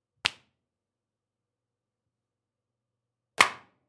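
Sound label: sound of vinyl record falling on floor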